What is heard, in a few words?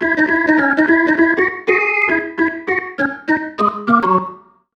funky organ riff; Sonic Foundry (Sony Creative Software); Ugly Remnants VOL-2